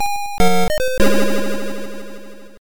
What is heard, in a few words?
Round Start Music; Pac-Land; ZX Spectrum 128